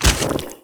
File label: grub squish, antlion grub; Half Life 2; Episode 2